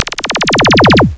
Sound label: pulses